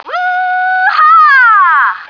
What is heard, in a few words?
woo; stars; taunts